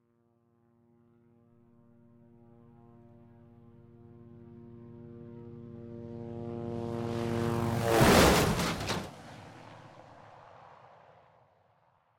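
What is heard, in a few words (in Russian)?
издалека слышный приближающийся звук вертолета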